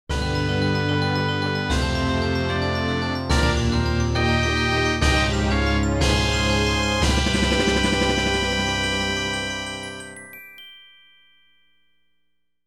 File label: Medal Get Fanfare; The Legend of Zelda Ocarina of Time 3D; 3DS